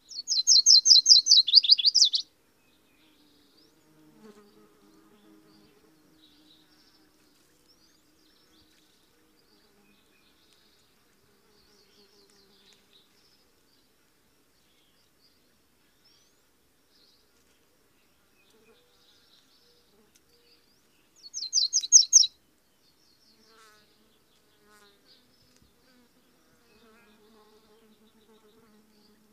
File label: Warbler Virginias Ch